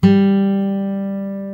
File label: GUI Ac. G, GUIT AC; Best Service Gigapack 2